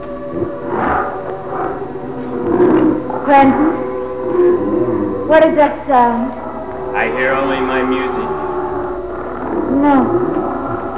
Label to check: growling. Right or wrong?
Right